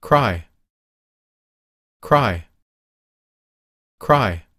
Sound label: cry